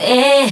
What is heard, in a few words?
"eyyeh" SUNG